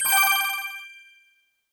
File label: Get Collect Coin